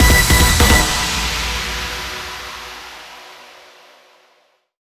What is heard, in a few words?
Burning-Hot Beats